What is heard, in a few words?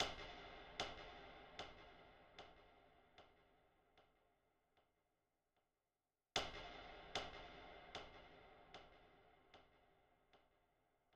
151 BPM B Min Accent, Trap; Cymatics; 2022 Melody Collection; G Wagon